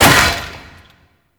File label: Shield Crack; Vehicles; Abilities; Thano Mech; Warframe; Nechro Mech